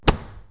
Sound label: wood chop, impacts; Wired for Sound Pro CD; Aristosoft, Inc.